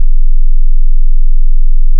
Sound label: sine 20 stereo